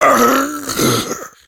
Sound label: death; STALKER; customnpcs; killer